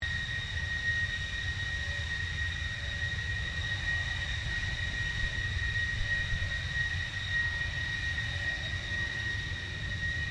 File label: Airoplane Jetfighter F-111 Idle Loop, Airshow; Avalon Airshow 2009; Airplanes (Sound Effects:Vehicles)